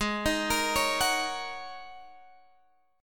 Ab 7th Suspended 2nd Suspended 4th, Guitar, Open G